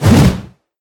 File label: swing whoosh